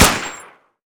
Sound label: MAUSER